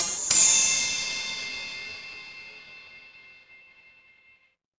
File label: instrument chime bar